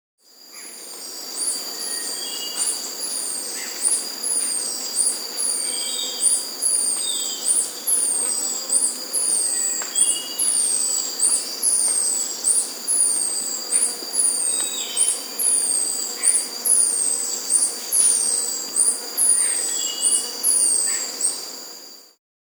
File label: Olive-Striped Flycatcher (Mionectes; S. America